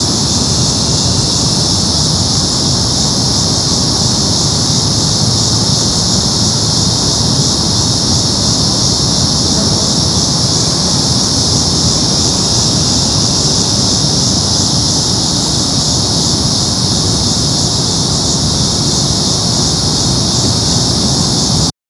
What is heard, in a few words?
Cicadas, ambient; First Ward Allens Landing; HOUSTON